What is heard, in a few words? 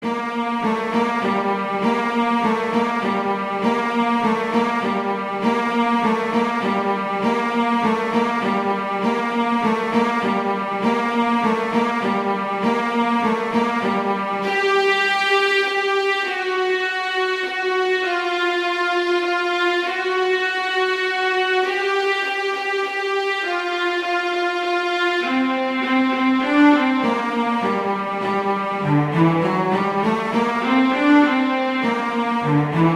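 Carol of the Bells, Viola; Christmas Beginners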